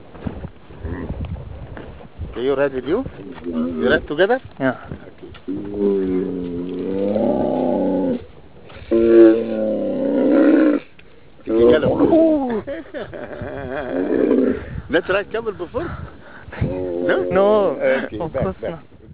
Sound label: camel